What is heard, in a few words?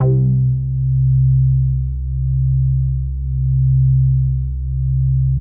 Big Synth Basses